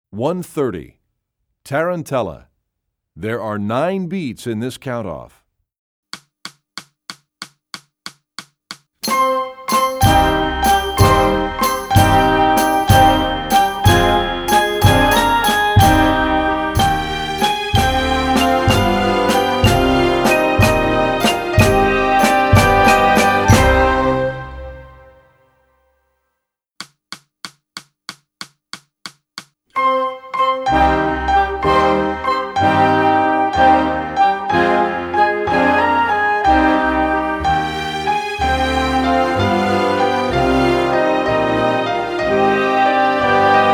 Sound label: Tarantella, Percussion; EE2000 Book2